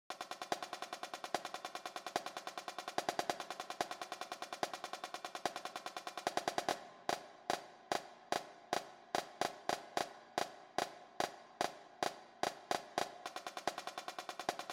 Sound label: jig snare